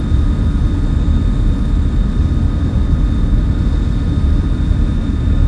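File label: engine blockade runner run loop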